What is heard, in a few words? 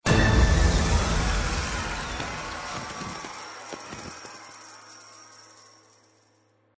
big win